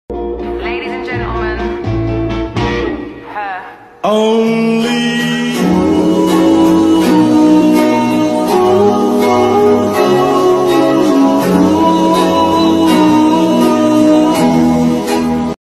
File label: BGM ringtone